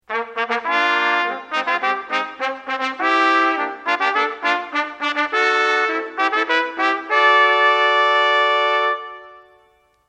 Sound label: Nobles Fanfare; A Midsummer Night's Dream